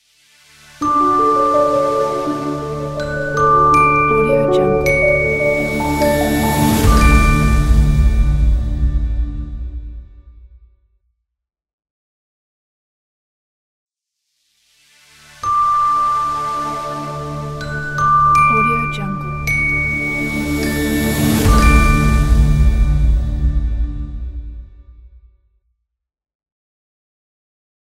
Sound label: elegant ident